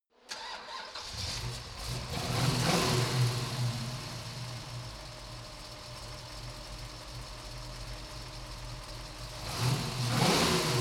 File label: car camaro start rev engine